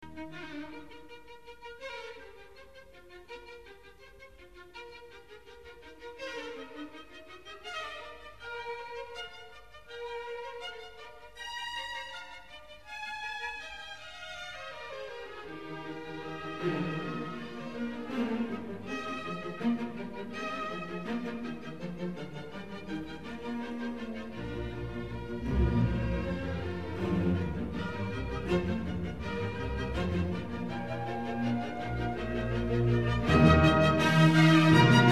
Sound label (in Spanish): BANDA